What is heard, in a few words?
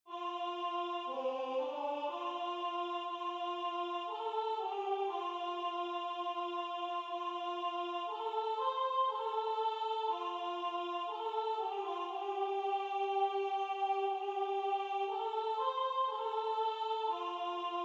Soprano